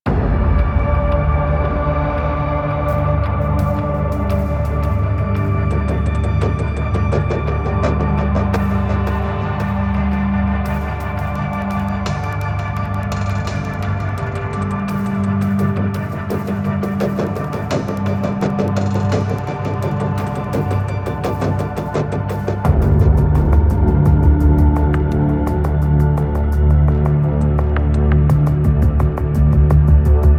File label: Heist Room Cue, Music; Venus; Fortuna; Corpus Deadlock